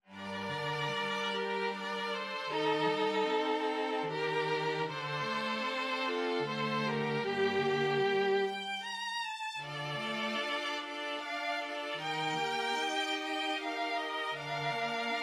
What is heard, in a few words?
STRING QUARTET; Romeo and Juliet